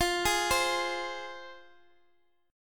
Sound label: F Minor, down, Guitar, Open G